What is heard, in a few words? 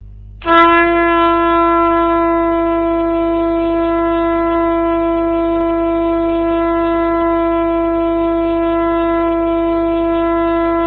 Shofar